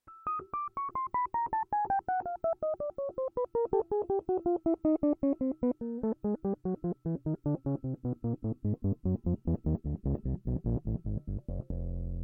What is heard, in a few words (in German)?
hohner pianet t alle tasten clean